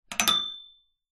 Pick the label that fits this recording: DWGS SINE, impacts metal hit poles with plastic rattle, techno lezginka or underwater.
impacts metal hit poles with plastic rattle